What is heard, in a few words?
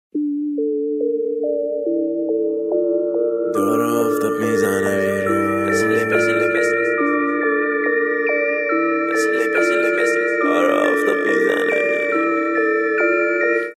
Mobile Ringtone